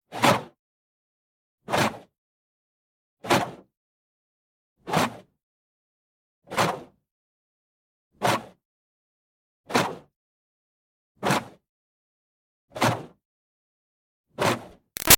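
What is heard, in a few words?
Water Spray interior Car bys Hard Fast; Car Wash; Spraying; Big Room Sound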